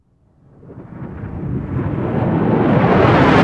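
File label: FX right, attacks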